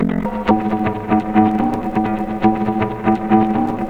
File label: RIFF GTR, GUITARS KIT; Big Beat Samples & More; AKAI